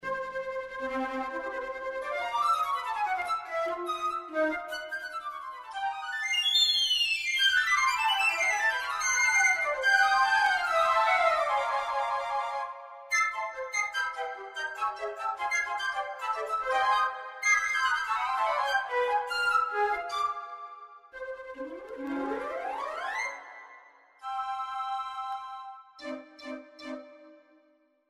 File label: FLUTES